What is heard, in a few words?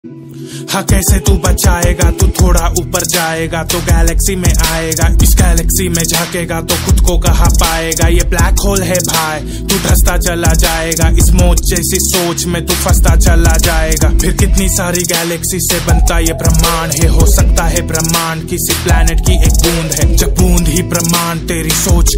ringtone